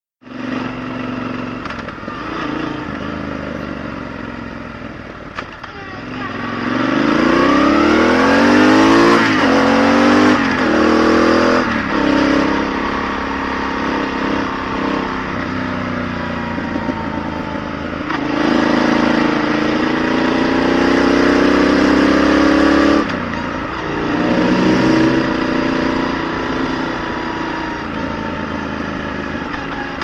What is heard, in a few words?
XT1200 Sound